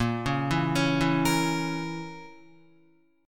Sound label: A sharp Diminished Guitar, chord; arpeggiated; JGuitar